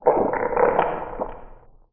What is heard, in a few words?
damage; Barotrauma; Barotraumatic; Coelanth